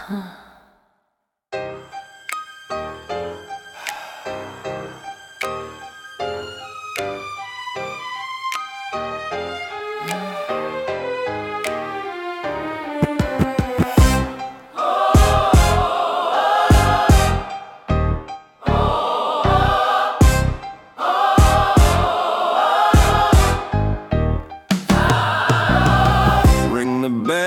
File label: Bell Ringer